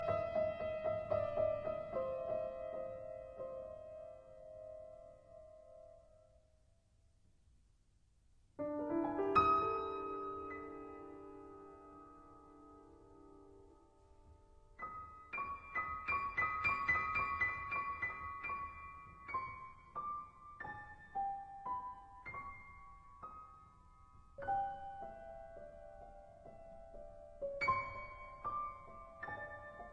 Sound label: Prelude in D major; 6 Preludes and Fugues